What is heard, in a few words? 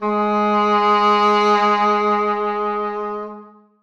cor anglais g#3; Sonatina Symphonic Orchestra